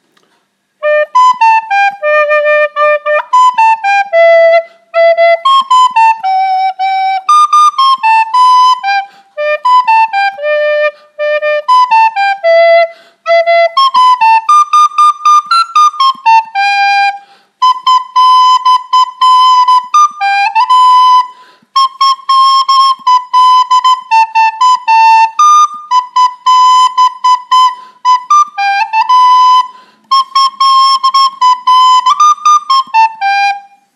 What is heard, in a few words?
Jingle Bells